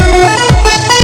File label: Tone For Sms